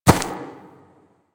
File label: Ram Step Single Grass; Animals; DE; Warframe; Quests; Duviri